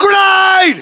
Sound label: grenade; fgrunt